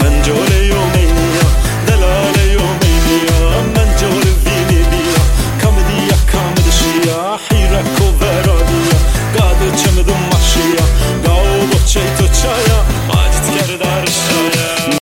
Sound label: Kurdish House; Mencol